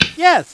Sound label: click yes